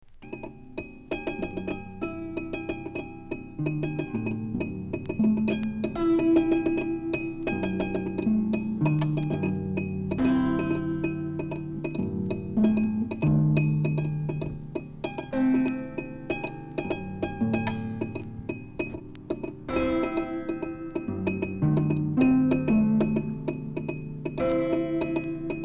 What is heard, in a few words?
Dreamy with a Steady Beat